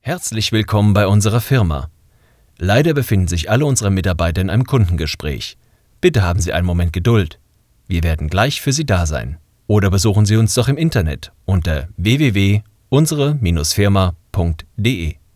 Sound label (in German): Warteschleife